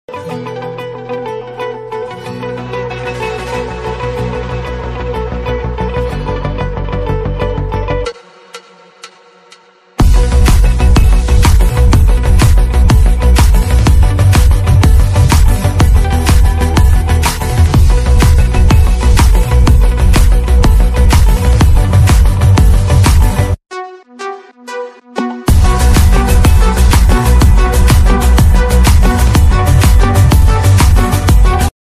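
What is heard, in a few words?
theme background music ringtone; Vettaiyan; battery theme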